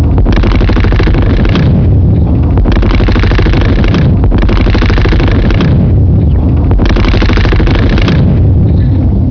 gatling

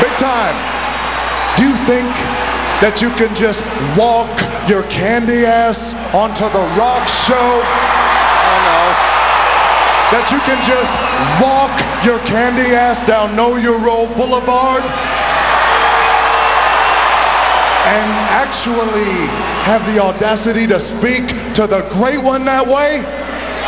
rock

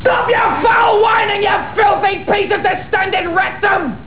whining

